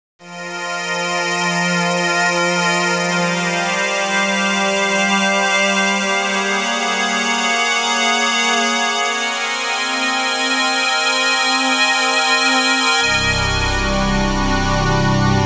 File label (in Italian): musica newage